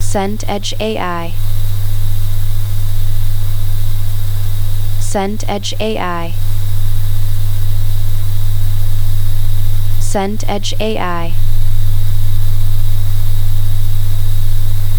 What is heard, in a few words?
room tone warm